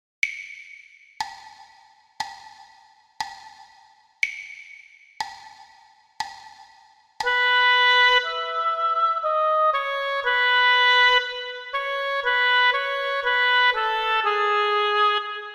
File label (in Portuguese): percepcao ditado